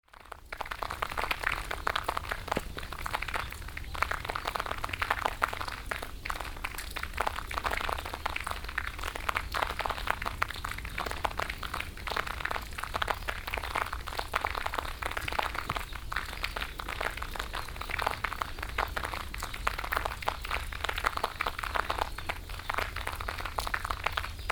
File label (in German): gewaechshaus kondenstropfen; sta-gewaechshaus-kondenstropfen